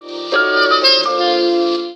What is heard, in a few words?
Lovely Notification Love